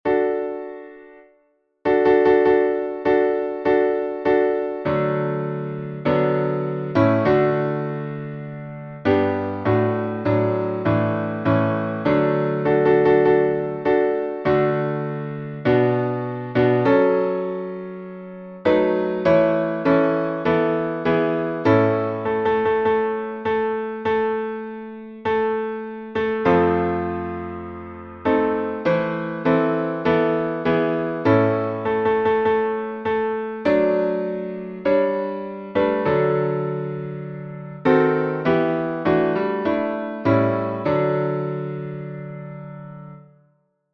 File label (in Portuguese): salmo playback